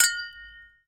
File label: Hit Glass Jar Clink; SFX Kit; Foley - Misc